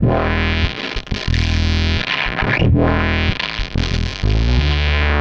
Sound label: Distort Wah, Bass; Effected; Bass X